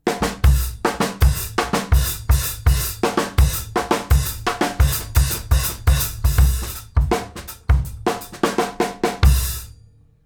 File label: KICK SNAR, Live Snares; PlatinumPhattCD1; E-MU Producer Series Vol. 8 – Platinum Phatt